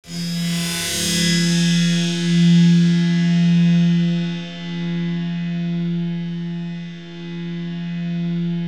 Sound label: MONOCHORD; Bolder Sounds - Granular